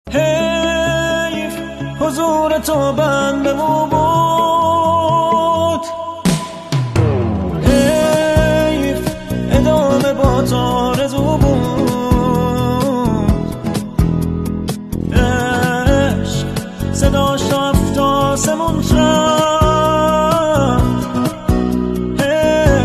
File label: bass, music